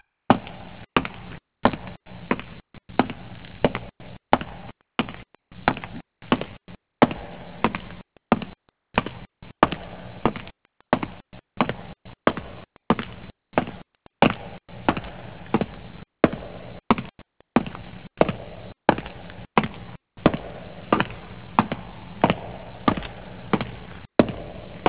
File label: steps